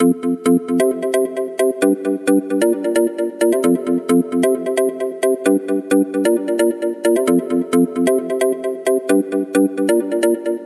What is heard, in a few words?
fitness beat start up